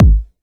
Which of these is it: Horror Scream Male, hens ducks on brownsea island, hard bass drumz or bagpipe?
hard bass drumz